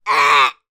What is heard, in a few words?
human boy 3 years old british vocal fake burp; zapsplat